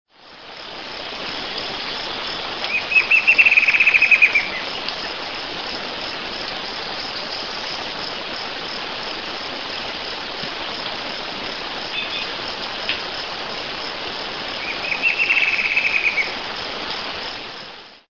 Herpsilochmus rufimarginatus Chorozinho de asa vermelha